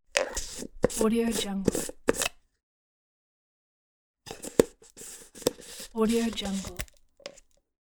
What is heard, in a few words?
twisting open close plastic lid